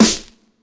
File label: Sizzle Steel Snare Drum Sample A Key, snare drum tuned to the A note